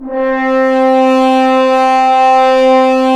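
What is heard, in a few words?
brass french horns, Roland; Roland - LA Composer vol. 1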